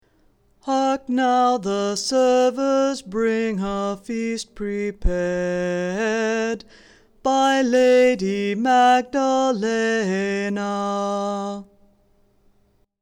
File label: feast voice, chant; Low Voice (Antiphone)